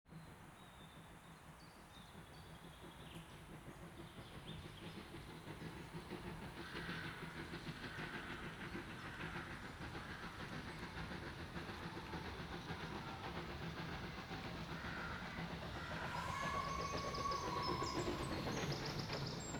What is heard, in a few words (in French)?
Le Voyage En Train Binaural; Monet